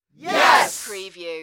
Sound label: Crowd Yes